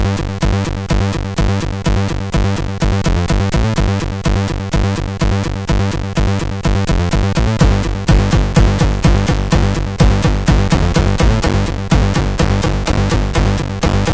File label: Slow Technoshit, sid MOS6581R4